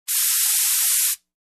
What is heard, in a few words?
Air Pressure regulation